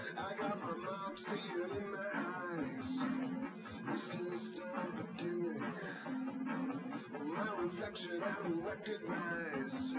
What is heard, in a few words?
wdrums liverec; SiSEC08; SiSEC underdetermined